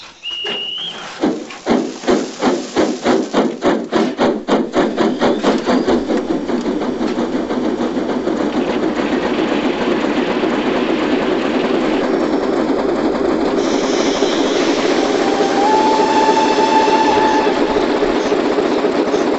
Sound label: Märklin Sound